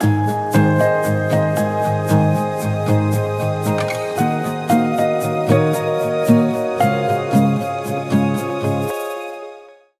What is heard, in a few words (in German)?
Brandmusic Musik; Mein Schiff; Brandmusic 10s